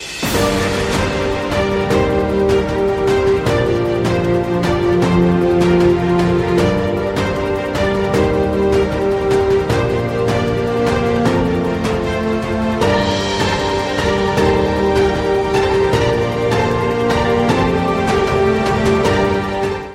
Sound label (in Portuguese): INSTITUCIONAL; pack de tilhas e efeitos 2.0